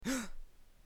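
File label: Gasp; AssembleeSFX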